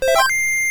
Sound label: Square Motif